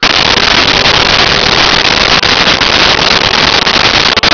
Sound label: chorus